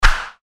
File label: Fighting Swing Sound; FXHome